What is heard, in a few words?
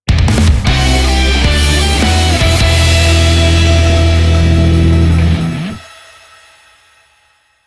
Training Win; World End Heroes Android iOS mobile gamerip 2018